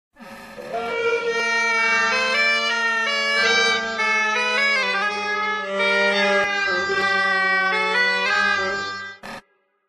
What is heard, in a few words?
badpipes, taunts; Source Engine; tf